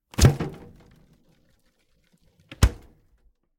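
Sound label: zvuki holodilnika, refrigerator sounds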